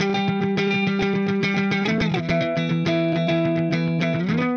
Clean Funk Guitar; Sonic Foundry (Sony Creative Software); Indie Rock Anthems; Session 02 - Clinical

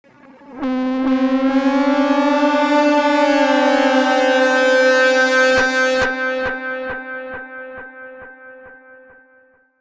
FX DIST; FX DISTO

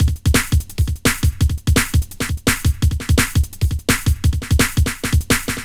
Break 170, Drum&Bass Breaks

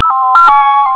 LFO wave; jazz jackrabbit 1; M8

